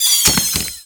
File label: Neutralize Alert; Warframe; Mods; Pet Mods